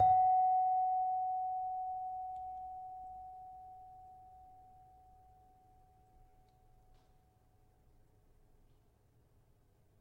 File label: Saron 5 F Sharp, gamelan tuned; gravityharps